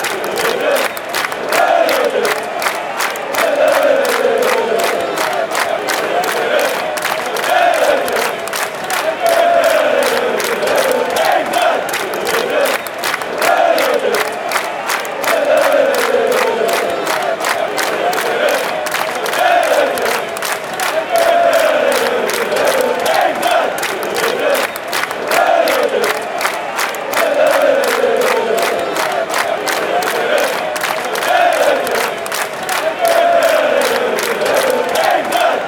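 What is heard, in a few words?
fanchants, football chants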